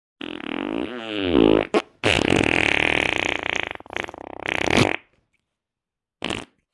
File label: autobahn fart; german